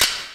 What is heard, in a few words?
JV KIT; Pro-Rec - Synthsonic; JV ANALOG2